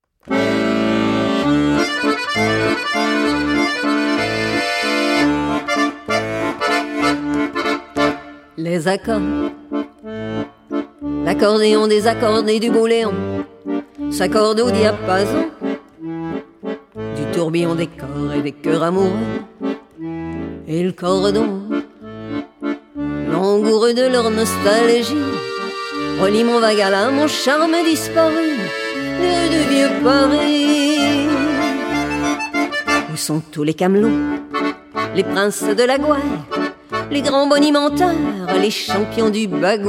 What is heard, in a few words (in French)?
Accordeon desaccorde